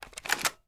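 weapon foley assault rifle grenade launcher reload end; weapons; gmod; viper; scharlie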